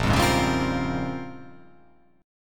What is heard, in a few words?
Bb Minor 13th, Bbm13 chord; strummed; JGuitar